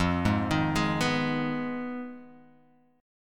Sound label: F Minor 7th Flat 5th, Guitar, Open G